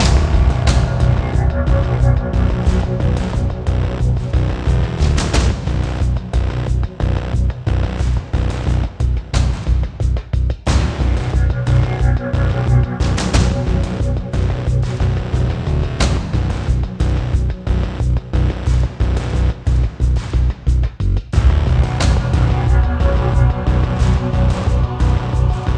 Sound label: Industrial Combat; LAYER 3